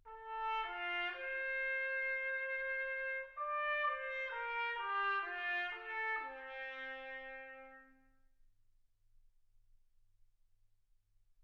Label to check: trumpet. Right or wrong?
Right